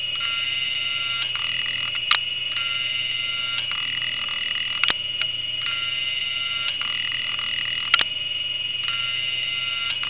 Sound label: Cycle Click, data recovery sounds